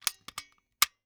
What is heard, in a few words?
Foley Toy Magnum 45 Gun Pulling Trigger